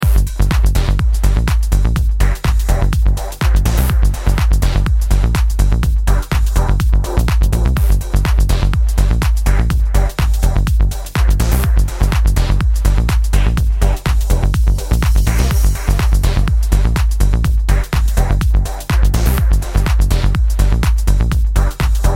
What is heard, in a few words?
Oldschool Techno DRY